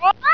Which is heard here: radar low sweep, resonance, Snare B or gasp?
gasp